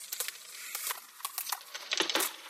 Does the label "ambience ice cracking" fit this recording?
Yes